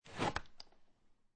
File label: Laundry Bottle Pick Up, Household, Laundry Detergent Bottle, Pick Up Off Wooden Table